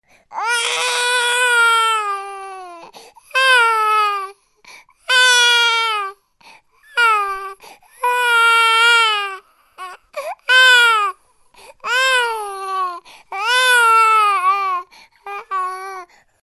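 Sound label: newborn crying sound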